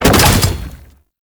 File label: Wall Climb Dodge End Default; Warframe; Oraxia